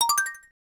cam start; KV920

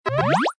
Folder Open; Samsung SCH-i539

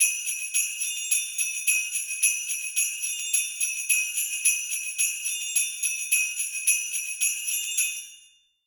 Holiday Bells; Google; Pixel-4; Seasonal Celebrations

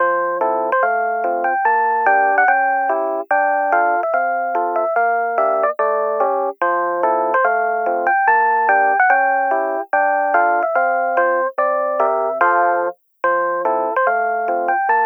ringtone

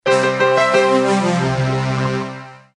Power down; Motorola E398 T-Mobile

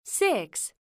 Key Tone; Samsung; GT-E1080F

